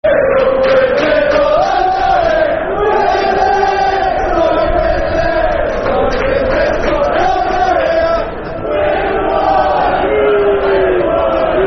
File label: Right Side Left Side, Football Chant; Soccer Songs; Cheltenham Town Ctfc